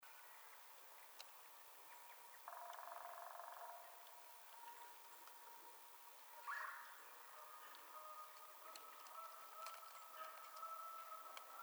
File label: Canada Goose